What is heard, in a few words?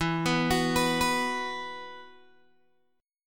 E Suspended 2nd, Guitar Open G; x,9,9,11,12,9; down